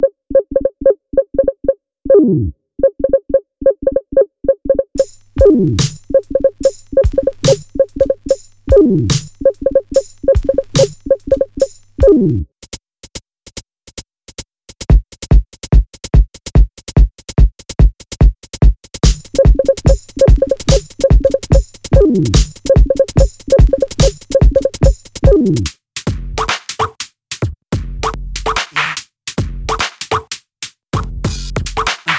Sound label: beat programming